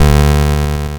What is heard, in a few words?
bass D2, LSDJ Notes; LIMAT LSDJ kit